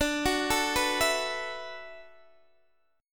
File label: D 6th Add 9th, Guitar DADGAD